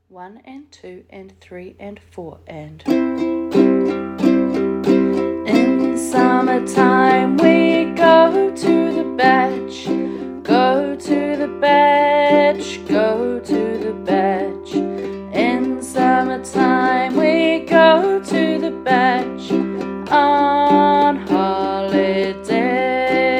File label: Uke; in summertime; Uke book